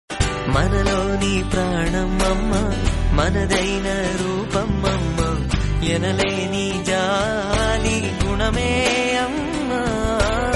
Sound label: amma song